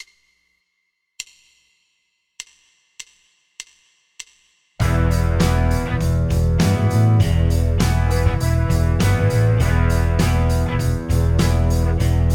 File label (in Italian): backing lezione 100 bpm